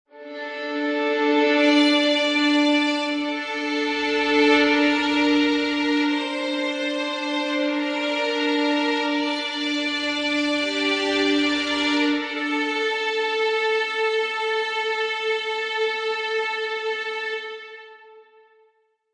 Violins fadeout loop Dm 160 bpm.; String Loops

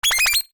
UI Confirm, User interface feedback sounds

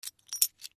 Coin In Hand, Foley; Pirate Gold; Money Sound Effects; Objects; Blastwave

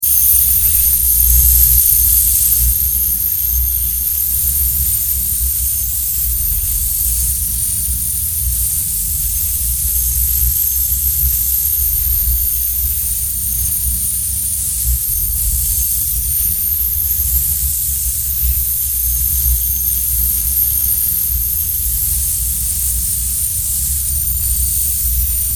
Electric, Discharge, Magnetic, Complex, Scifi; 5.1; BlastWave

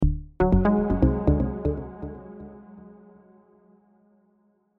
Synth Accent Low, Synth Accent Low Mysterious; Synths; Musical; Blastwave

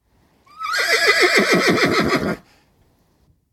One horse neighs, exterior; Horses; Animals; Horses Neighing; BBC

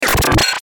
Glitch High Stutter; Production Element Imaging Element Accent Transition; Blastwave

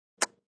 Quick Kiss, Short pucker kiss; Kissing; Human

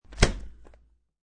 Window being shut.; Windows; Household

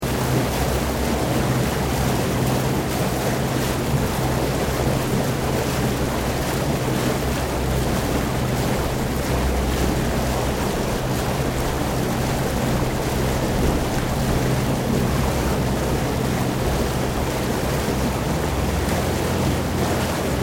Boat Water Taxi Moving Loop, Small water taxi; Boats (Sound Effects:Vehicles)

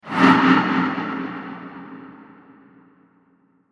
Slow Dark Whoosh; Whoosh Sound Effects

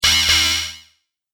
Barrier Hit Warning, video game

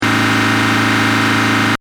Engine: High Revs Loop, car; Diesel; Cars (Sound Effects:Vehicles)

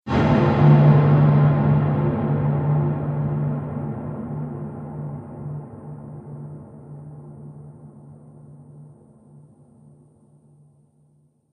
Drum Hit Trailer; sound design

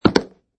Suitcase Unlock; Suitcase being unlocked

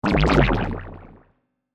Plasma Pulse By, Scifi, Pass; Science, Fiction; Sci Fi Sound Effects

